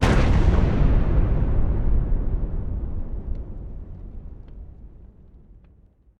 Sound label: battle remote boom